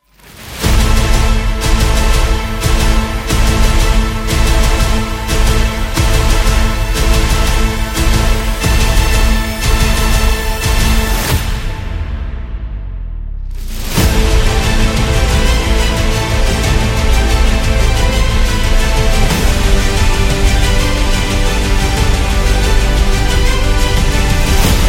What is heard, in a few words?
Epic Opening